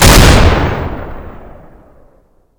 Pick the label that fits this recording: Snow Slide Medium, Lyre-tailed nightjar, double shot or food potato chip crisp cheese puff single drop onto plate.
double shot